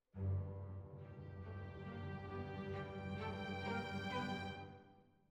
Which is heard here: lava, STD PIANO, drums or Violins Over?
Violins Over